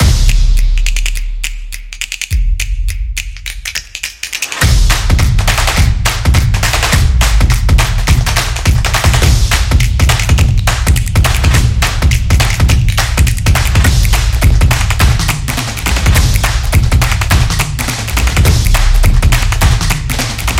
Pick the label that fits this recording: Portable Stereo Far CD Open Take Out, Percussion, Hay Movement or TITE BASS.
Percussion